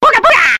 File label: booga booga, Voice; ooh ahh